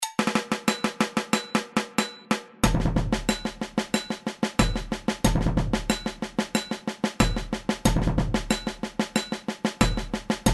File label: Surdo Showcase click, samba; gutchaka